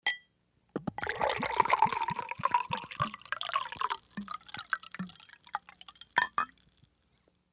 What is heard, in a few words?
Beer Bottle Pour Glas; eating & drinking